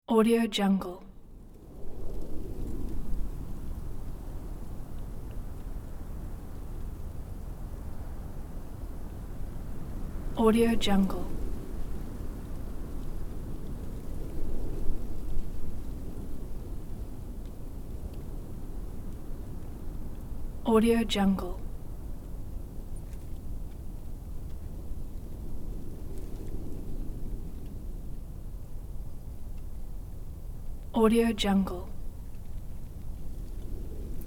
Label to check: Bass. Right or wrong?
Wrong